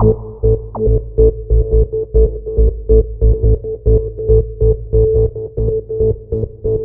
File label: 140 House Arp Amin; Dance; DJ; Synth; Arps; Pro Tools Essential Loops; Digidesign